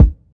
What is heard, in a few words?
clear kickdrum c sharp key, steel kick drum, Loudest frequency: 120Hz; kick drum samples